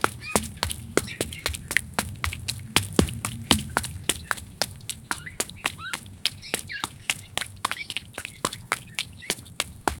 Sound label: year old kid running, wet concrete